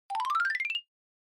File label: Xylophone Up Long